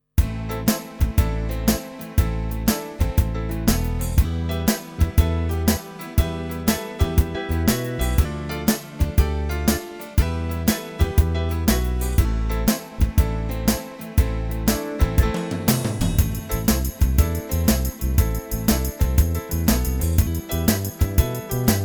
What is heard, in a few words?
Unpl. Swing